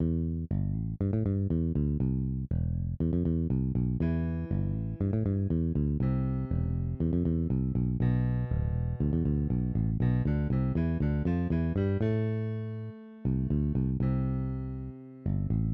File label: bass trill